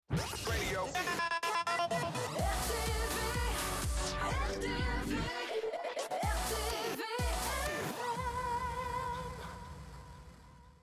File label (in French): Jingle Chanté; RTVFM; mac do